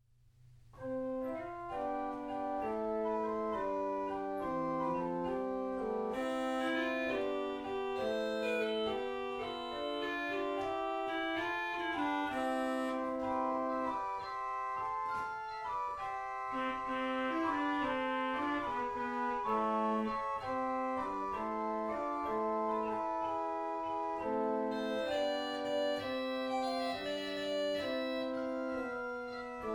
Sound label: flutes reeds, organ